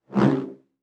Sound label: Science Fiction Sci-Fi Saber Light Saber Whoosh